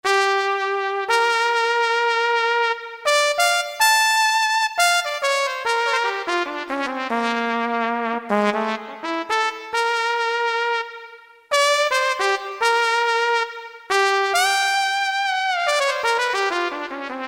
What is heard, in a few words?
Sweet Tp, Yamaha YPT-400; Voices